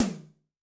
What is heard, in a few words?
AC Tom H, Yamaha DTXpress-II